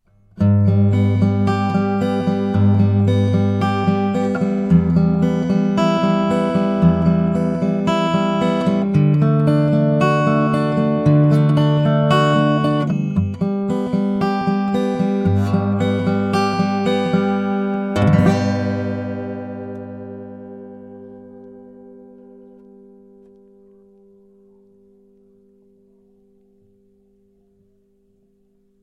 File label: guitar; Shure SM4 大振膜电容麦克风上手体验